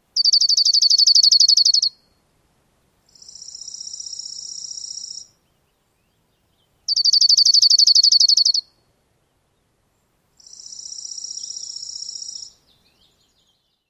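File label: Dark-eyed Junco two males countersinging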